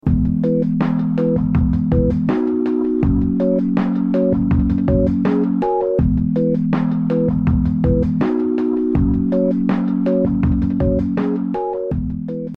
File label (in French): son jingle